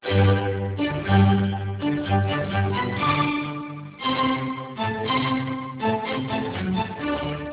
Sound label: Music G729